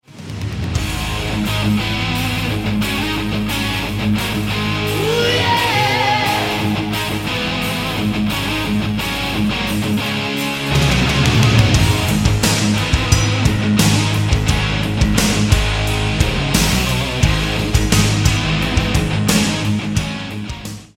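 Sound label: Palm mute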